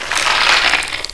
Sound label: death; quake2; mnhcoop